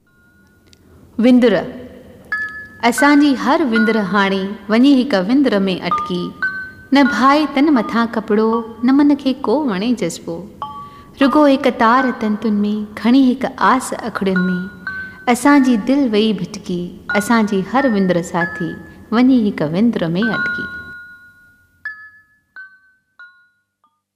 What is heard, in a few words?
windr